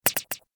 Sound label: Arcade Game Thwip; Video Game Sound Effects; Arcade Game Sound Effect - Retro Inspired Sound Effects - Think Nintendo, Atari, C64; Andrew Fleming